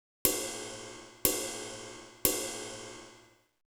Ride Jazz; hydrogen

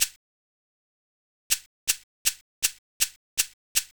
626 Shaker; hydrogen

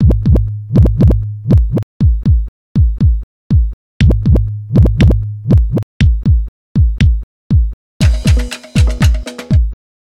drum; hydrogen

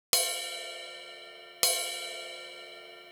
Crash HI Bell; hydrogen

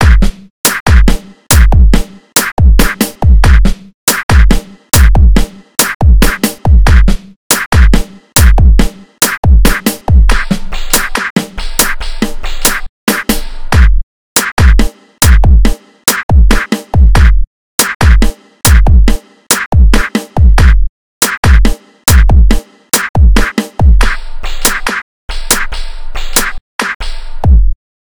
drum; hydrogen

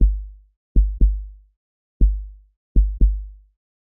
VariBreaks Kick; hydrogen

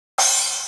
Splash; hydrogen

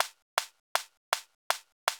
Clap; hydrogen

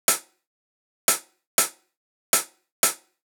HiHat; hydrogen

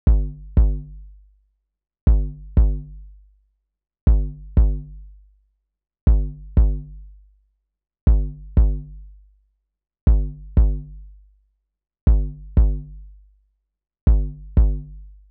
808 x-over; hydrogen

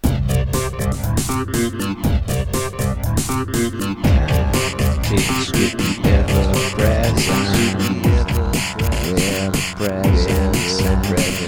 rolling down vox

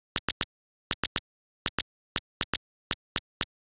HH Denon (with tick); hydrogen

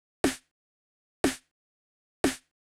TR909 Snare; hydrogen

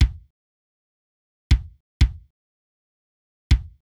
kick dry; hydrogen

